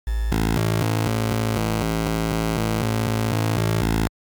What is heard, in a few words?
vshift, LSDj Wave Synth